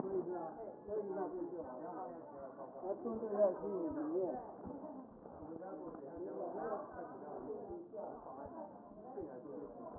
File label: A CHEST